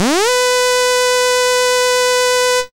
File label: SID lead B; ChipShop Deluxe; ChipShop Hits